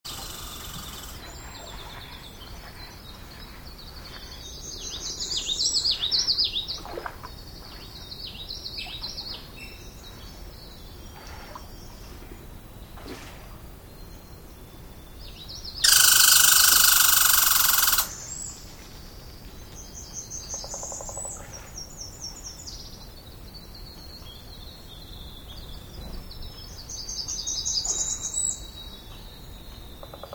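Washington Creek Dawn Chorus, Isle Royale National Park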